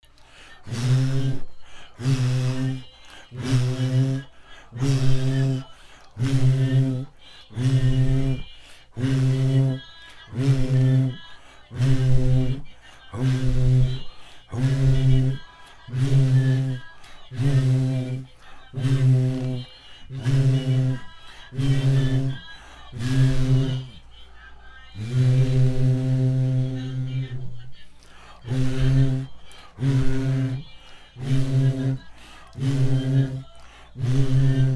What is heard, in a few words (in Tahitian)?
Himene tarava Raromatai